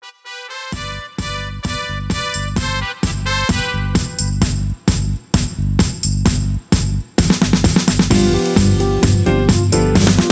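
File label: Leads No background vocals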